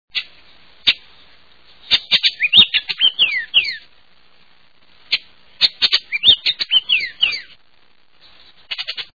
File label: Bullocks Oriole